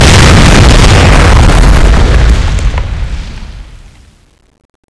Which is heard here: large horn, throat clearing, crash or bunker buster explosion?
bunker buster explosion